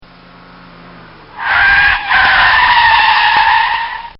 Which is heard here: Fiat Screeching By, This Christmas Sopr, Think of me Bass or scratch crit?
Fiat Screeching By